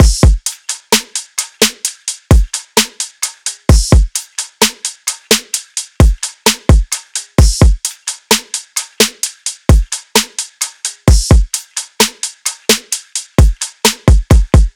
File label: drums 130, Full Drum Loops; Slate; SEISMIC Drum Sample Pack